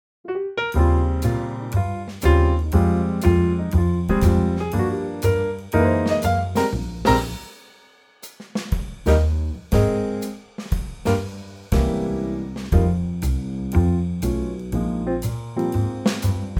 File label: Eb trio